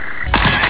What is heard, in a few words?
CAMERA; Más de 2,500 Juegos